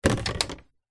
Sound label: hairdryer put into metal holder; household; zapsplat